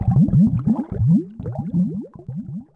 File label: bubbles; DAoC; Toa